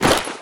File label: equipment jingle; taunt; player; yeti standee; source engine; tf